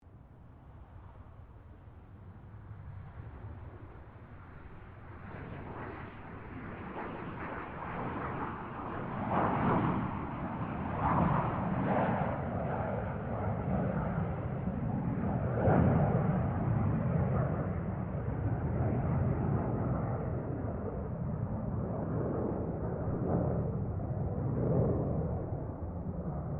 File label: AIRCRAFT GLOSTER METEOR F MK8 TAKEOFF DISTANT STEREO